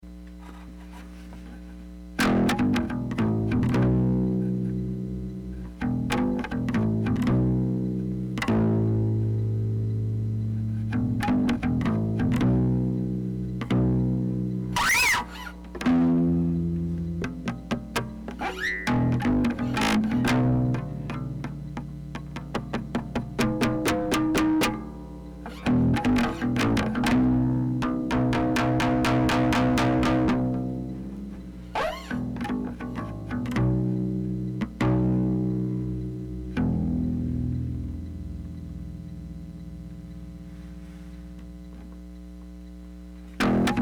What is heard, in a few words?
Bass Guitar Loop; hyper terrestrial